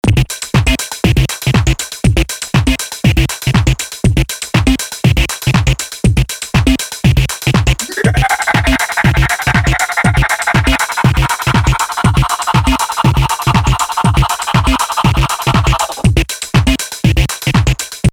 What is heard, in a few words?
Processed Tech Screamer